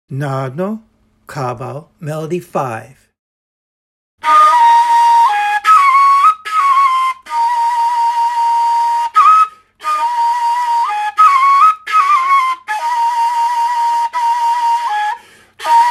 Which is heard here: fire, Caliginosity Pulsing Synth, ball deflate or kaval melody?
kaval melody